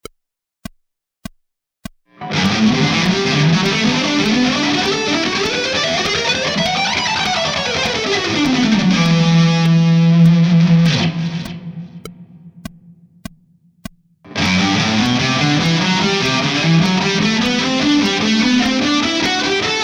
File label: connecting scales, guitar